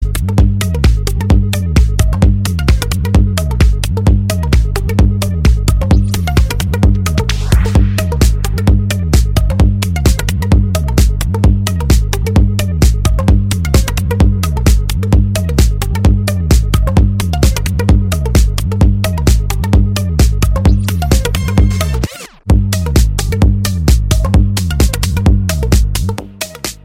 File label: Is Calling RNB